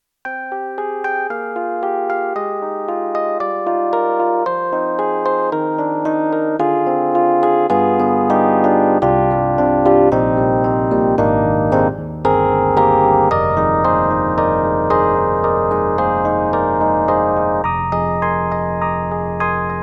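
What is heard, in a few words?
YAMAHA MU1000EX Flops, piano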